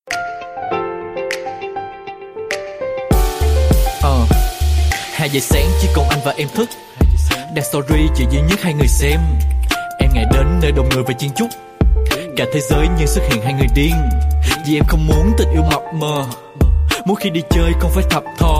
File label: Hot TikTok